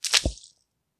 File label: splat; grunk